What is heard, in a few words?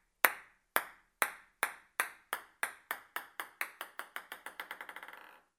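ping pong ball on table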